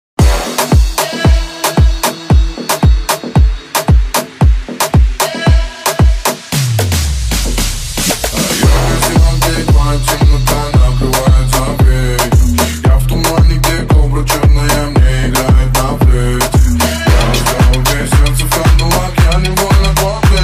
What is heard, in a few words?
moombahton mix remix